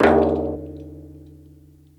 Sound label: gambler mouse click; Butterfly Lovers; wazdan; ultrafast